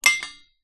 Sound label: household padlock large movement on metal